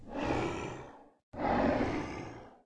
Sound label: ogre idle, monster